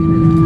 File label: yellow pump, A32X